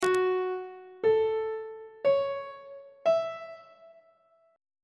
a ionian 7th chord 6 is f sharp minor 7th on treble clef